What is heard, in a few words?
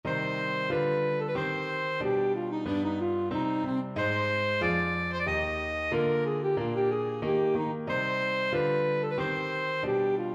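beveridge maggot alto saxophone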